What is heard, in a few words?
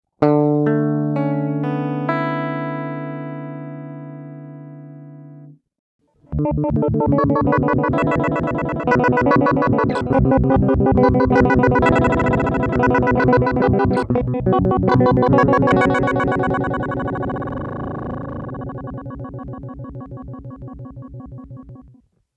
Octave Tremolo